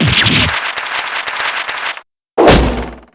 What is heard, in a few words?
breakdown